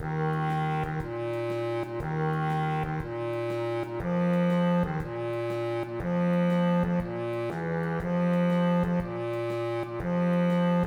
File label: tuba; Jul